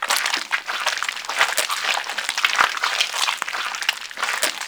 Sticky Ink Tentacle Move Loop; Gameplay; Mutator; Warframe; Shadowgrapher

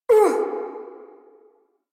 Pain, Barks; Warframe; Corpus Lich; Persona D

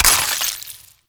Ammo Pickup Viral; Warframe; Frumentarius; Ammo Ability

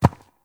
Ram Step Walk Dirt; Animals; Duviri

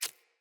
Root Spider Step Water, Foley; Animals

